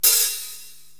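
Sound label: Hi Hat Open, Korg TR-Rack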